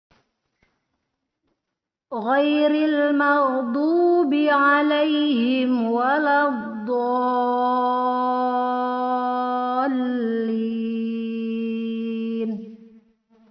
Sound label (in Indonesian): Perekaman baru Karaoke denoised; cara waqof; cara waqaf